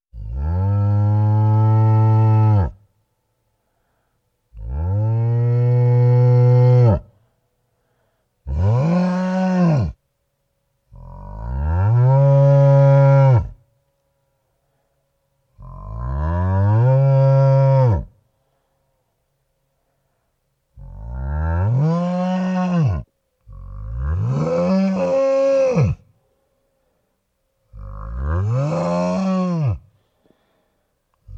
Cow Mooing

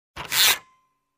Rolling paper on a printer

